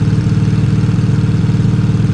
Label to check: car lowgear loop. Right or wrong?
Right